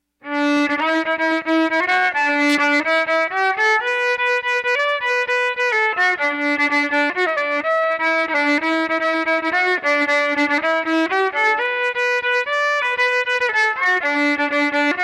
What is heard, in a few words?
Shady Grove electric, fiddle